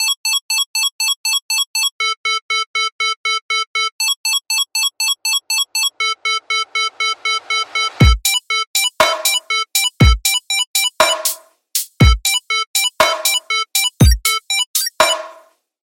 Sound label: error beat